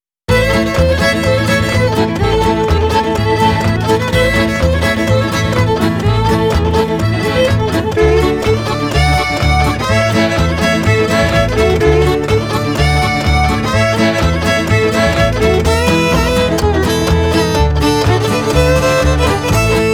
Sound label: southern hoedown